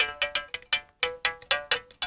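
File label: SM MOUTH BOW; Spectrasonics; Heart of Africa 2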